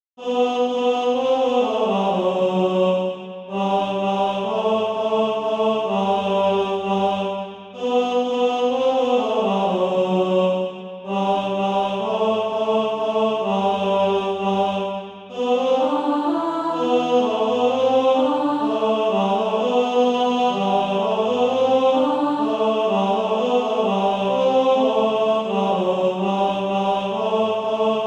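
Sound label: tenor, SATB Practise Music; Ding Dong Merrily on High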